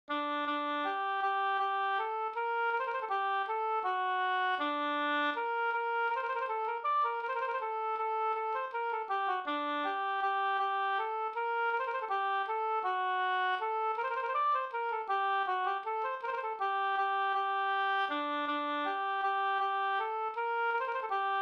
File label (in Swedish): vals g moll